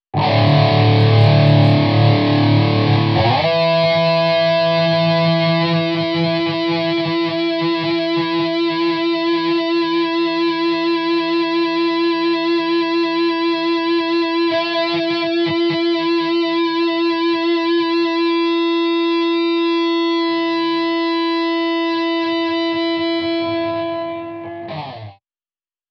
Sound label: Sustainer sample Jackson